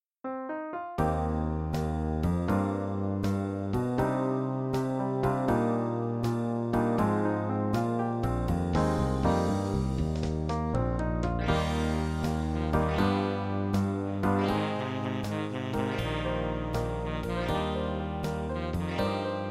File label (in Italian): anni '40 dinamiche